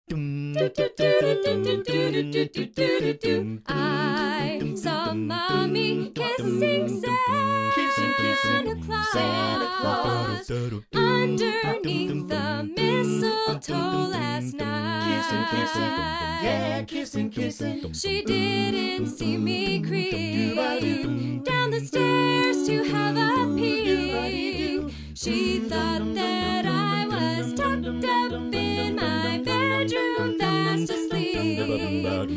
Christmas Carolers

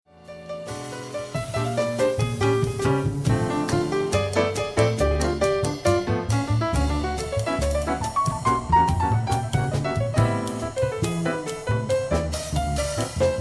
Jazz Trio; Indianapolis

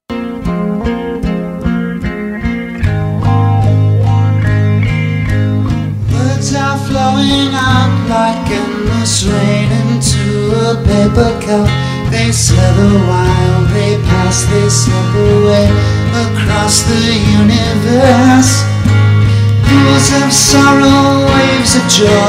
Rock Band, Cover Song; Las Vegas

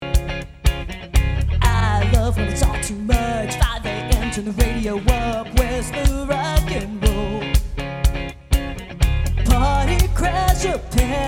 Cover Band

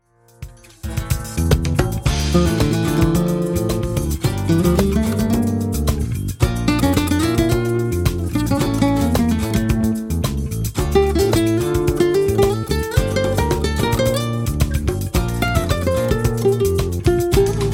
Flamenco Guitarist; Atlanta

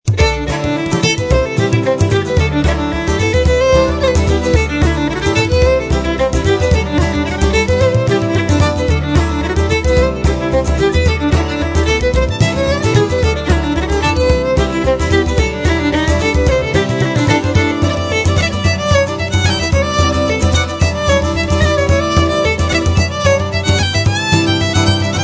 Irish Band

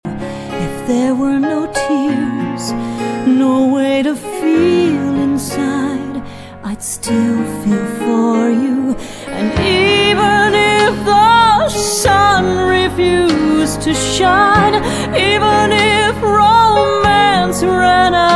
Country Singer

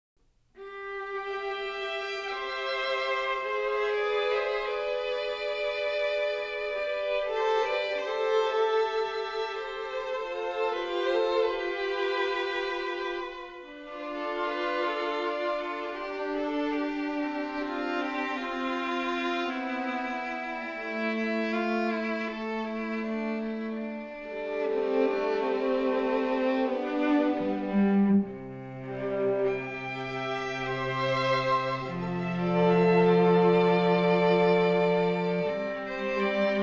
String Quartet, Clair De Lune; Seattle

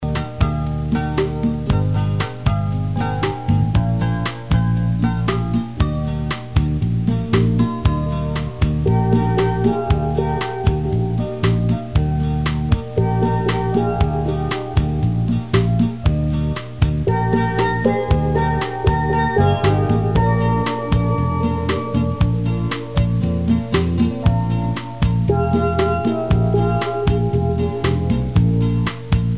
Steel Drum Soloist

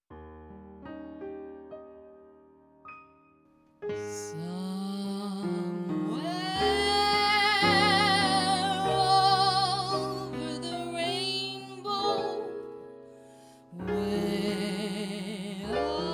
French Singer

